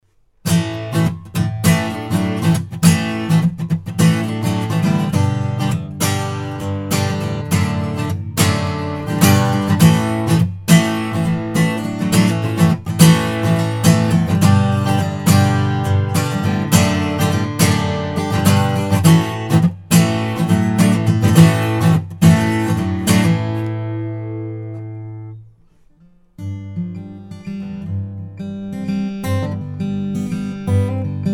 Zoom R4 MultiTrak Acoustic Guitar Internal Mic

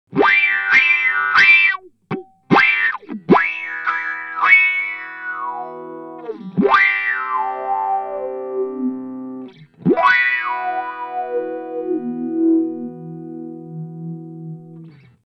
Spatial Delivery HP Up; Earthquaker Dievices

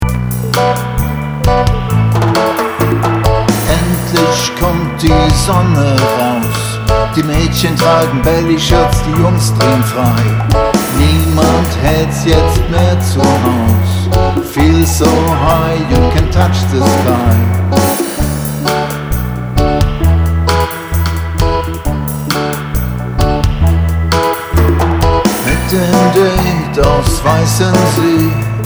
Behringer 646 reggae

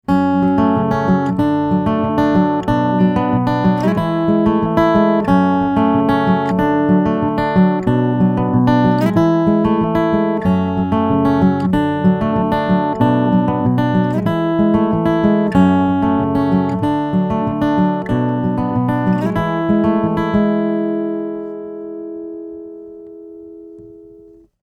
ibanez aam700ce nt finger picking both pickups

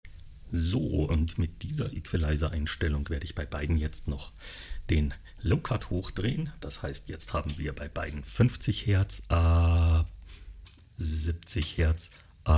Neve pure 50dB EQ LC80

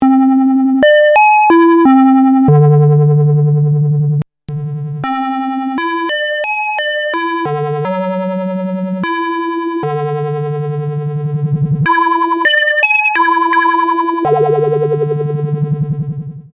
MOD VCF BP; MSW

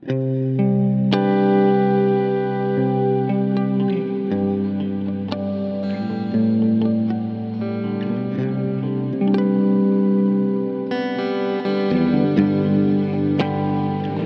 Fundamental Ambient Lush; Walrus Audio

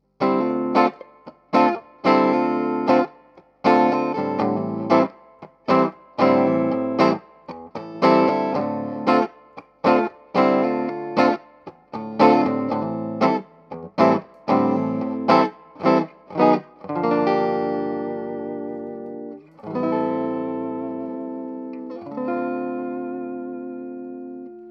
Warm Audio WA-19 Guitar Amp WA-19 EQ

